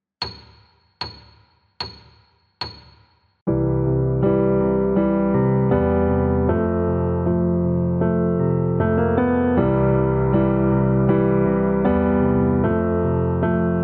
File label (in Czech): 75 metronom, klavír